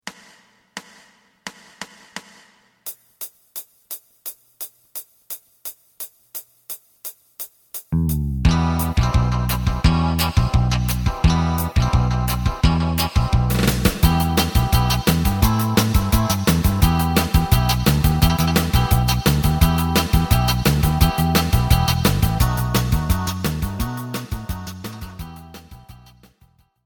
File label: lead